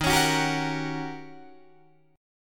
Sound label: Eb 7th Sharp 9th, Eb, Guitar, Open D